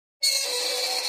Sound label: Metal Scrape On Metal